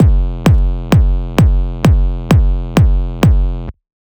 Full Kick

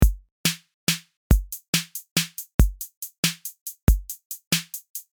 34 to 68 drum pattern

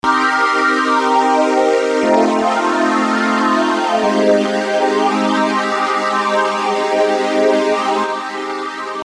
V+ Chord Example

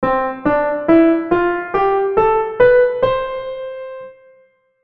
c major

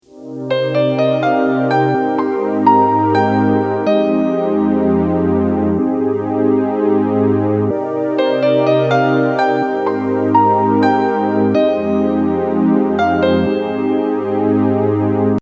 Melody example

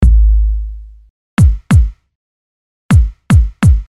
BD Modern Garage

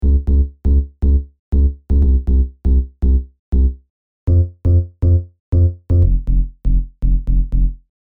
Bassline

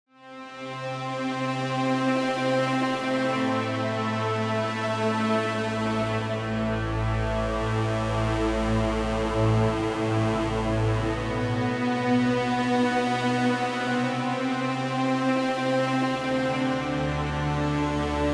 cube pad

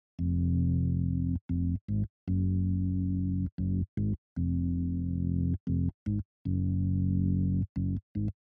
Bass

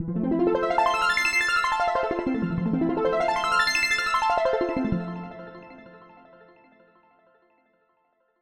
Wet Arps

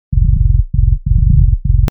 FILTER

